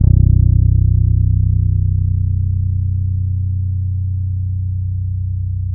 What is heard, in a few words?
WARM FRETLES, Bass; Spectrasonics; Bass Legends CD 1